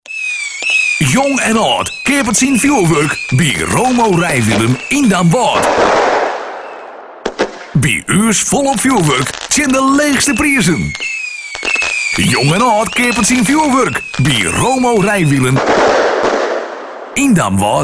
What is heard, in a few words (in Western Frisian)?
Fjoerwurk; ringtone; Romo Rijwielen